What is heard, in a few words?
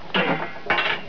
PHONE, 电话; 特酷声音素材库